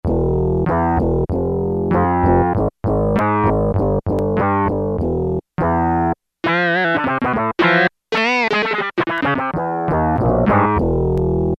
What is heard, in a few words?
WOW, Yamaha TX81Z